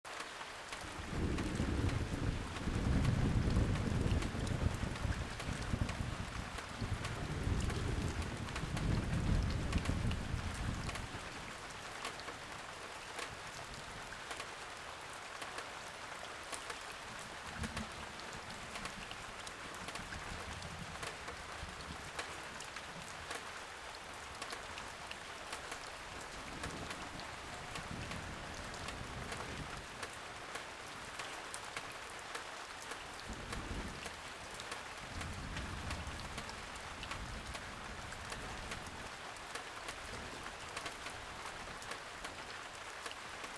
thunderstorm

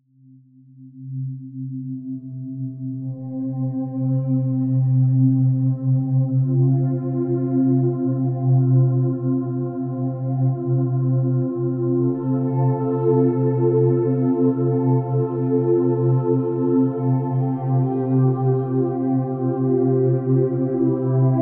deep sleep delta ocean

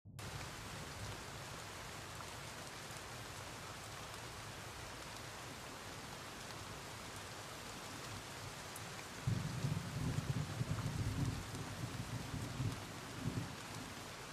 thunderstorm

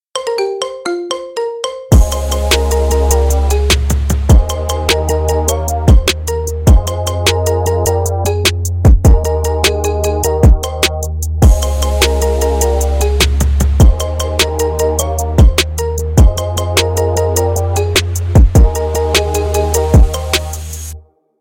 marimba remix rington; russian

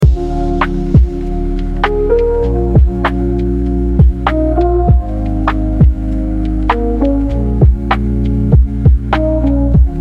lo fi beats lo fi hip hop

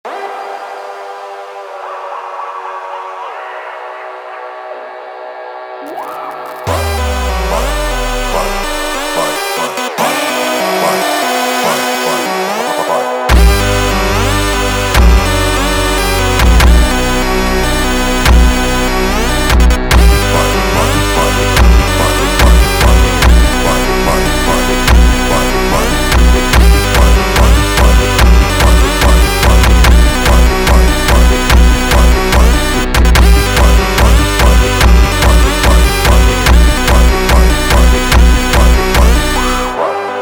glitch

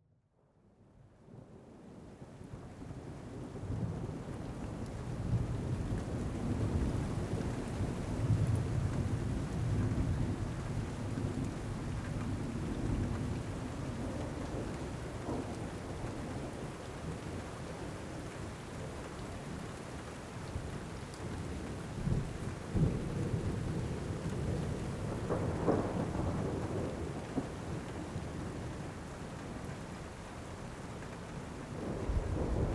calming dreams thunder

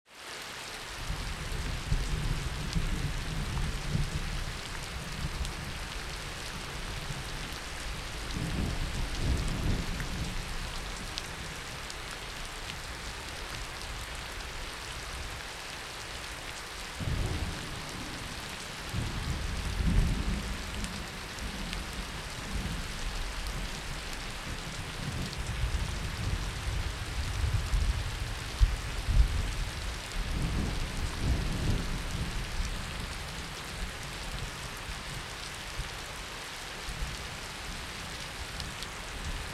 thunderstorm

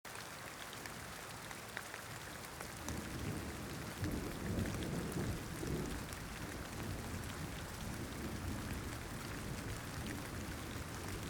thunderstorm

